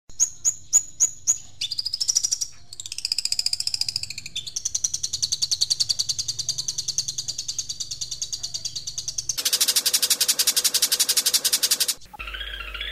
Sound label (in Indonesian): kombinasi cendet variasi